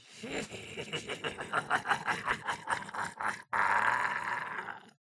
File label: Spring laugh; gamerip; the joy of creation halloween